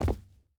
footstep hide concrete jump land; Rust

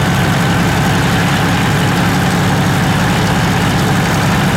tank engine rpm 99 load; engines; War Thunder

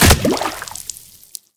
bullet hit water

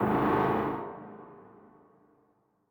damage hits explosions, Warships

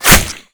impact flesh; weapons; Modern Warfare

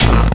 health low start

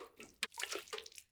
waterbucket throw; Rust

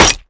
claw connect

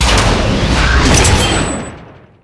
ammo on; so inf ammo on

